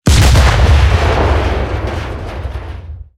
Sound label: tank aphe explosion